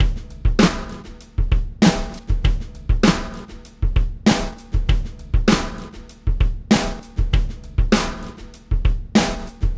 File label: snare